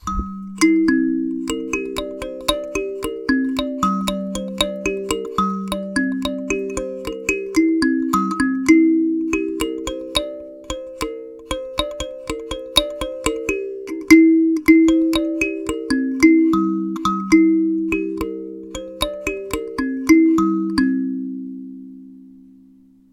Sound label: kalimba